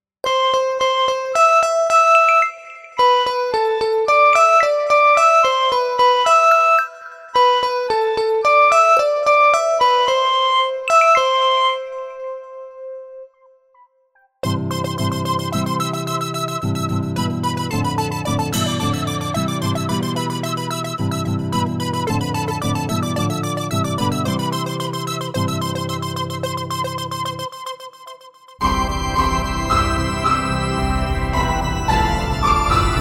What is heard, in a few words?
keyb kor